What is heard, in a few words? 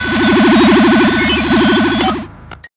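Terminal Charging; unsighted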